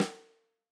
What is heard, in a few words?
Tam Snr, drum